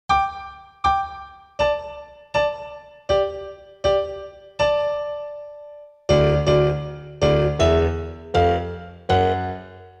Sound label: Old MacDonald Had A Farm Slow Accompaniment